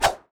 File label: Sword Swish; SandBox Musics